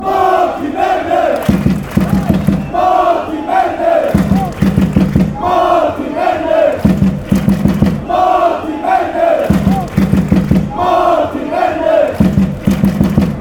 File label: fan chants; martin